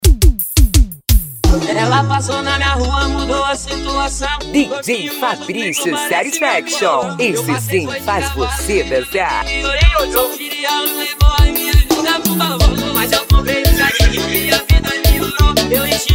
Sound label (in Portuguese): sound bass automotiva; uba mg